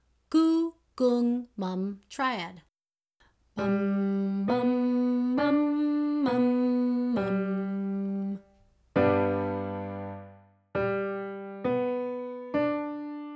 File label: mum triad beginner, vocal exercises